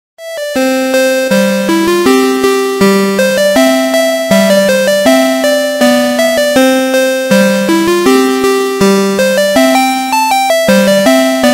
8 bit ringtones

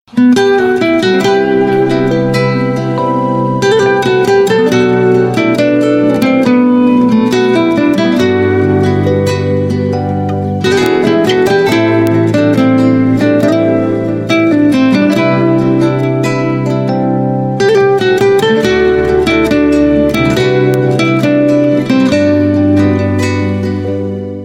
guitar love ringtone

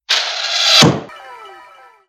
love arrow message tone

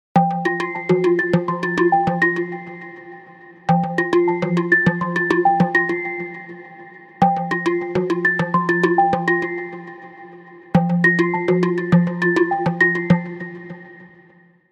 electronic salsa

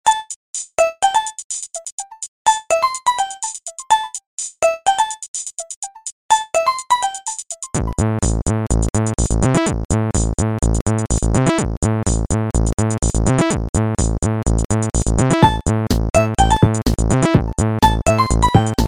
ringtone polyphonic

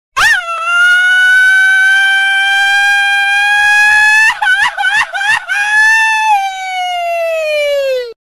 mexican grito ringtone

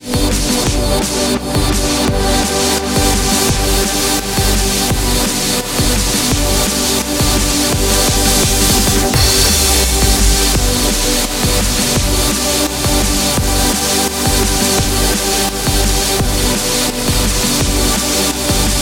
dynamic ringtone

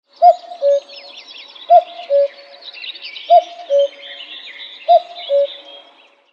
cuckoo bird sound effect

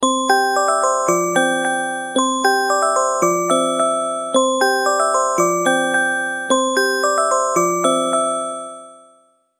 morning glory alarm ringtone

